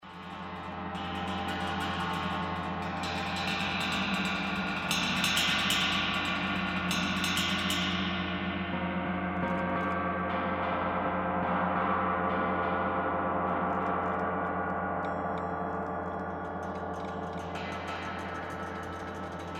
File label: Gong